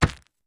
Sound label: Flash Bulb Pops Poofs